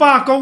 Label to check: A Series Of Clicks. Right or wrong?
Wrong